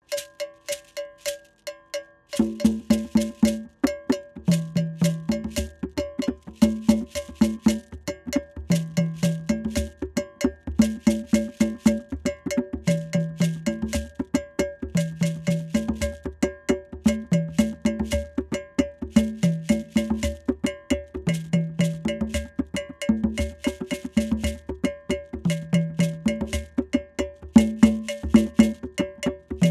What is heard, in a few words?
Percussion